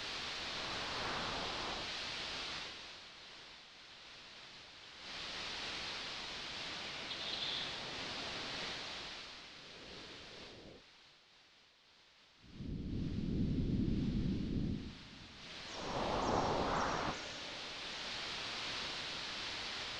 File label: silent forest; adarkroom